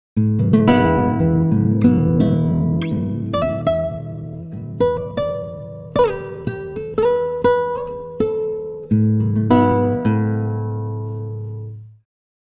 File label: guitar, time stretch